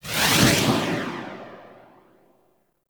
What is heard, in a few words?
Iron Eruption Active Move; Warframe; Warframes